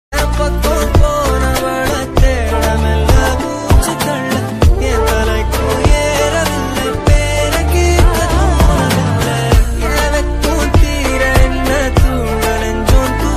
marriage ringtone

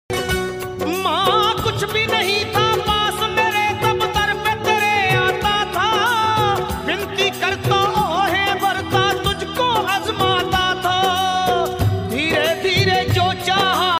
bhajan ringtone

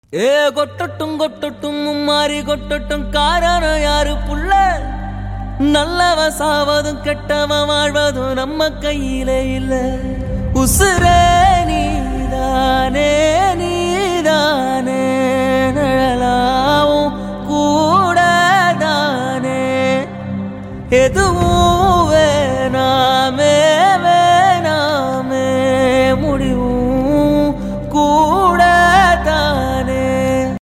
short cover tamil cover song

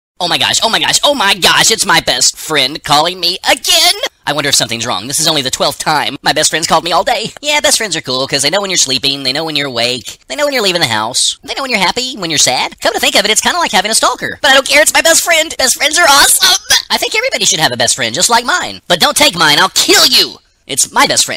funny ringtones

